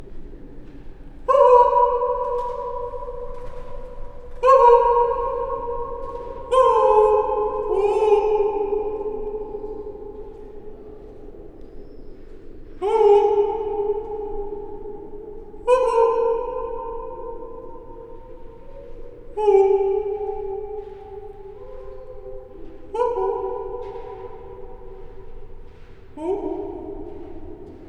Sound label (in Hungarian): dionuszosz fule visszhang